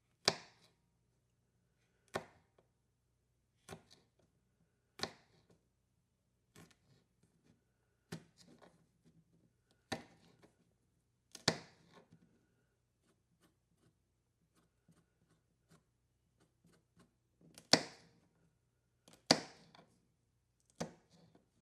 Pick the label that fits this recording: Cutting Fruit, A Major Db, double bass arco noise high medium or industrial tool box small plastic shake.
Cutting Fruit